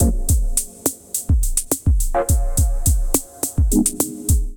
64 Pads Dub Techno Kit groove 105 bpm; MIDI Clips; Ableton; Drum Essentials

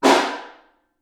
Snare Far

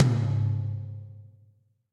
Tom Mid DarkPlateVerb; Drum Hits; Ableton; Factory Packs; Session Drums Club; MPE Hits

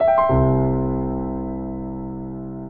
Ending Arp Up & Root C Major 89bpm, Grand Piano; MIDI Clips; Ableton

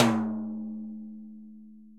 Timbales C L Rim; Latin Percussion; Drum Hits; Ableton; Factory Packs

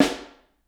SN Overhead, Snare

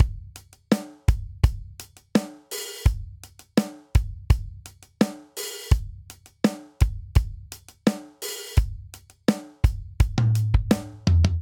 Straight Eighths Rock Verse Variant 84 bpm; MIDI Clips; Ableton; Factory Packs; Drum Booth

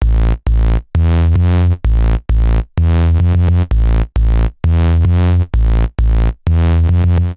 Kick A Minor 130 bpm, Bassline; MIDI Clips; Tonal; Skitter and Step; Ableton Factory Packs

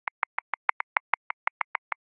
6 Feel Groove; Grooves; Ableton; Factory Packs

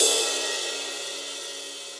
Ride Edge DeepSnareDynamics; Drums; Drum Hits; Standard Hits; Ableton; Factory Packs; Session Drums Club

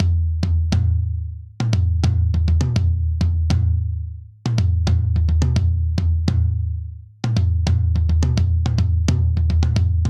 Inbetween Hip Hop Toms Only 86 bpm; MIDI Clips; 4 4; Ableton; Drum Booth

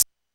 maraca; Drums; Ableton Foundation FreePacks

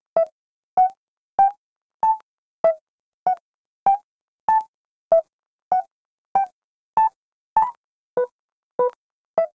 E Minor 86bpm; MIDI Clips; Melodic; Dirty Back Roads; Ableton; Factory Packs; Chop and Swing

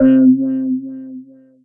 Nightmare Toy Piano; Ableton; Factory Packs; Retro Synths; P-50 Linear

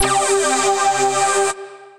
Synth Lead; Instinct Lead; Ableton; Beat Tools

